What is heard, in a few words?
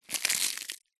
leaf dry crispy crunch crush